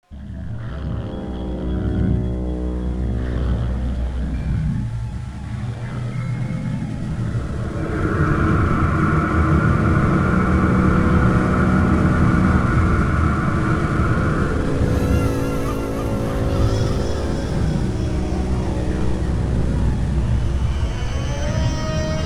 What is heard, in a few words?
alert theme; sawer; cof; slender